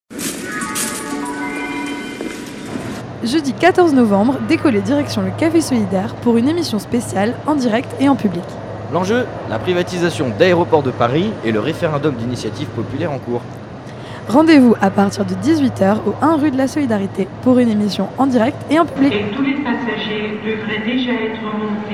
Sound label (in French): jingle; referendum contre privatisation adp